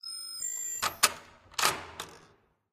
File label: bypass lock; Secret Agent